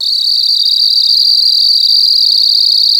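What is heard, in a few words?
Brazil cricket, World Whistles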